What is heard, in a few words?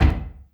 Oneshot; Sonic Foundry (Sony Creative Software); Esoterik Beatz